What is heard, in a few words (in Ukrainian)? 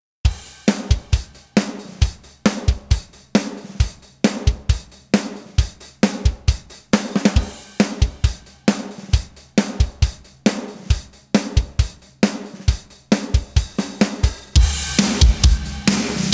Обробка ударних До та ПІСЛЯ